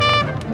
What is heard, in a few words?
Trompet hurt; Stikbold! Dodgeball Adventure Deluxe